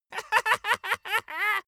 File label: dwarf laugh gleeful